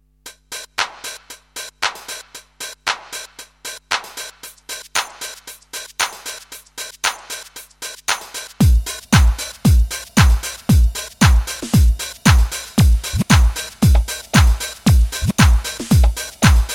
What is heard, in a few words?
Swing House